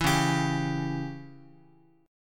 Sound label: Db Diminished Db down Guitar; strummed; JGuitar